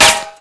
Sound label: metal; Mafie 1